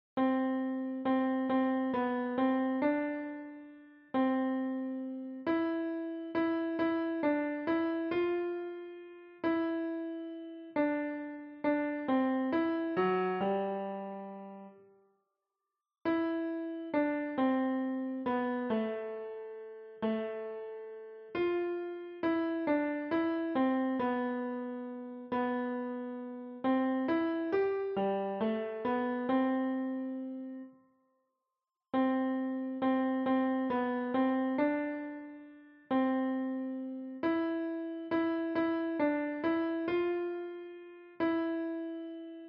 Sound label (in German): Bunt sind schon die Wälder Klavier